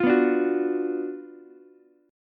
Gb Major 7th Suspended 4th Sharp 5th, Ukulele, GbM7sus4#5 chord; GbM7sus4#5 strummed; JGuitar